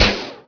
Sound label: Dart; Deep Fighter